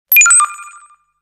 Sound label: High Sine, Nokia Tones; Windows Phone apps